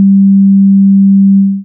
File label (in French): sinus sol2; sinusoide; TP: Autour du oud